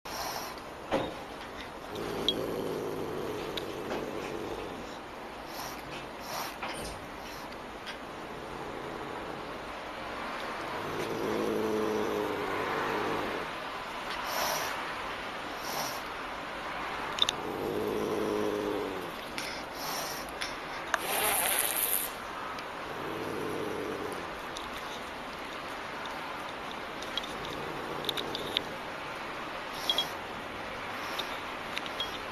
manul rychit

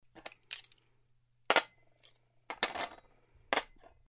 servirovka stola

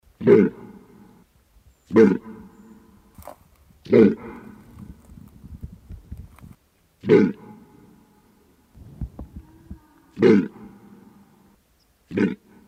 olen izdaet zvuki

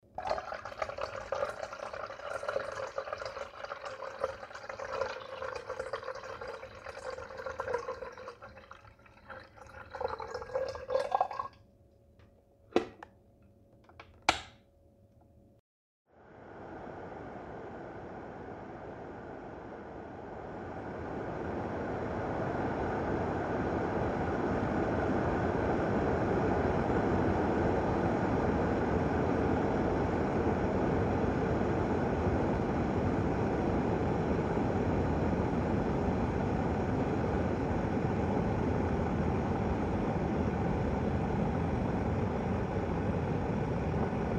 voda kryshka kipenie otkl

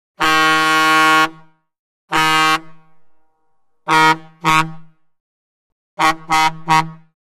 Signal klakson fury gruzovika

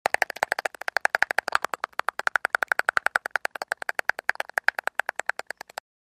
stuk zubami zamerz

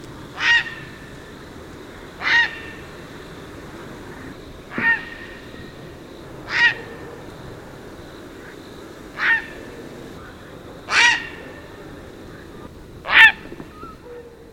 caplia

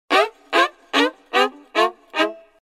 skripka effekt

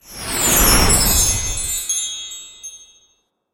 sani proleteli

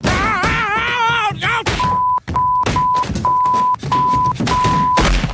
padaet po stupenkam; peter griffin

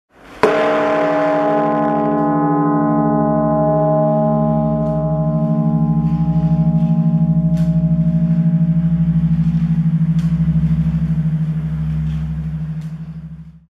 zvuk udara v kolokol

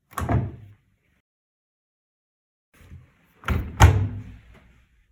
otkr i zakr liuka stiral mash

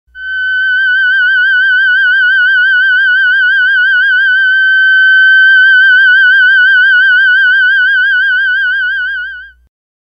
termenvoks nota fa diez

